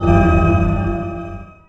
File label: Pickup Secret